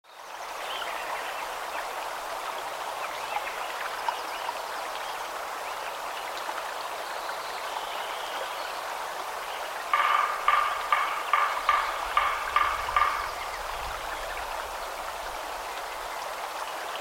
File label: Lincoln Meadow; Bernie Krause records Lincoln Meadow